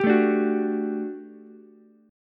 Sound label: G Major 7th Suspended 2nd G down Ukulele; GM7sus2 strummed